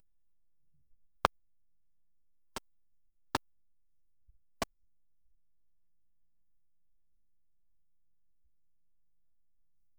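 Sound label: pixel art video game footsteps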